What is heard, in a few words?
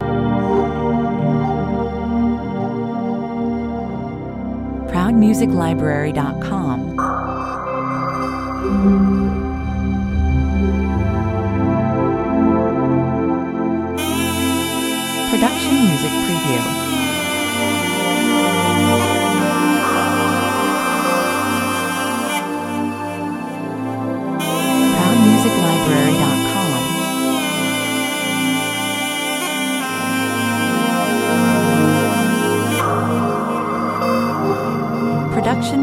tunisian soundscape 70 bpm